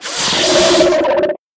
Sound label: Queen Hit Critical; We Are the Dwarves